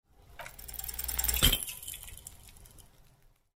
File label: check negativ ui sound